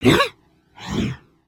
idle, creatures organic

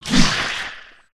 baby spider attack; creatures mechanical

